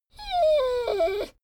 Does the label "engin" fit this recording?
No